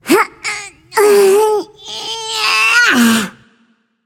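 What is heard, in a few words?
nonverbal grunt lift; smite; voicepack; izanami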